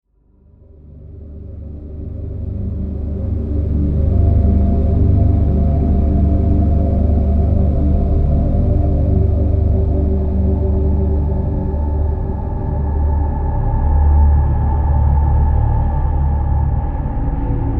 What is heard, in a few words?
endless drones